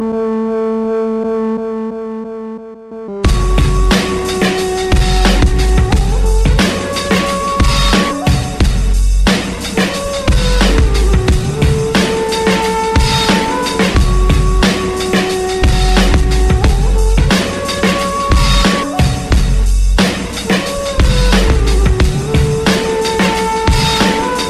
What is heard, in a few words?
Indian Dub